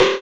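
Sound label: FX synth; Sound & Vision - Gigapack II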